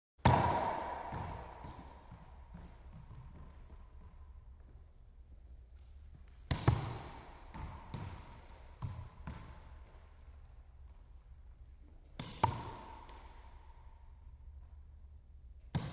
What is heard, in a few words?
Racquetball Being Hi, racquetball